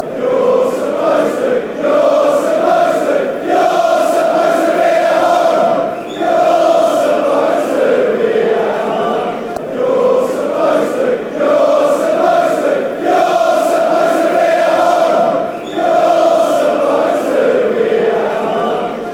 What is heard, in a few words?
youre supposed be home fanchants; itfc